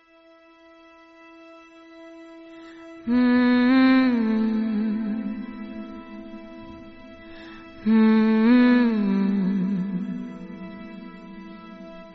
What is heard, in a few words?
Lullaby; kalki 2898 ad hindi 2024